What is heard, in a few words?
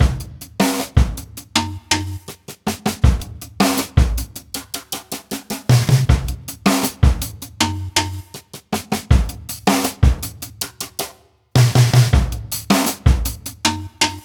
Loop Jam 79 Bpm; ReBrush